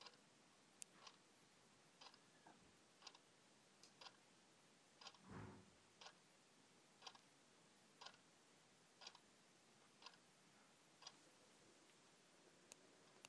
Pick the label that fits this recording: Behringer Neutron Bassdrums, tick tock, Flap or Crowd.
tick tock